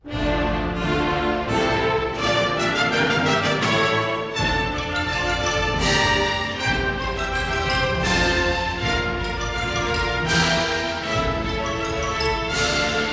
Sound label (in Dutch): orkest